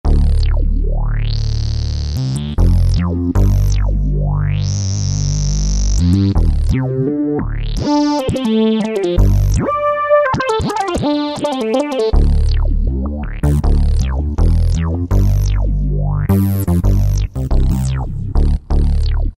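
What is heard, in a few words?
bass Fatty, E-mu PK-6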